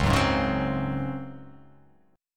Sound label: Db Major 7th Suspended 4th Db down; strummed; JGuitar